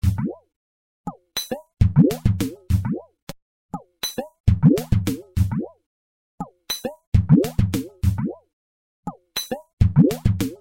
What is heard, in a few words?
electronord, sfx loops